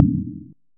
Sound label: impact Metal; Kenney's Sound Pack; Sci-Fi Sounds